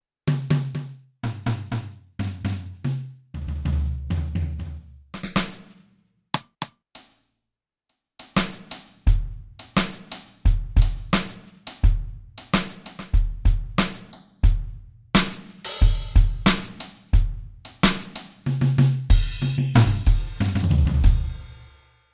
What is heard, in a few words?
Drum Kit